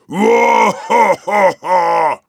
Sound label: Heavy laughter big; хохот